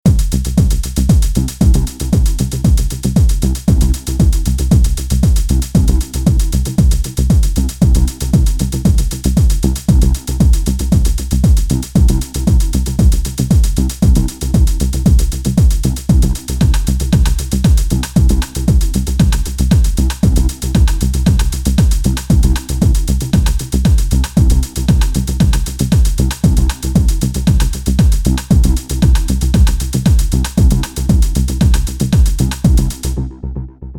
Beat Ambience; Streets of Rage Remake

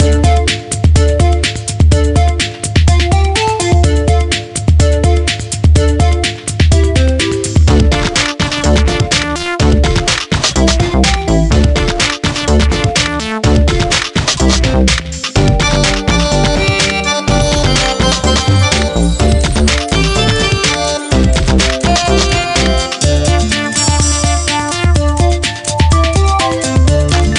Music Chase; Wizards of Waverly Place Spellbound; NDS; Music Wiztech Chase

